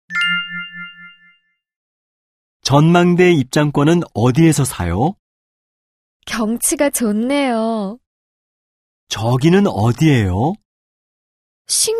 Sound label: K-POP